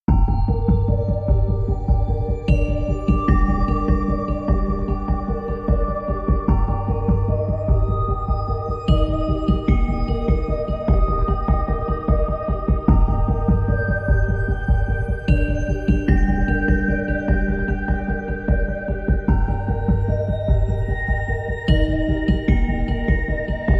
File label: No Theme Bells and No Alarm